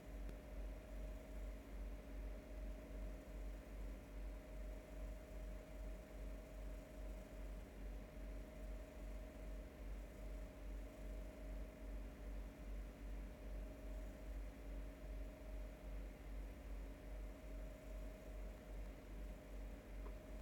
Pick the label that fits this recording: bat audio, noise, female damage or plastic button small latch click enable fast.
noise